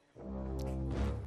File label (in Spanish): suspenso